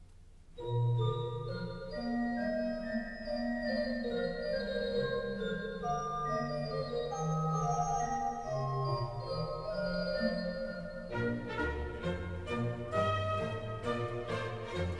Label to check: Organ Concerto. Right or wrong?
Right